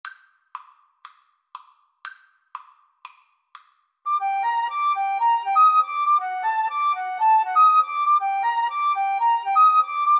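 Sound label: salsa 2 flute karaoke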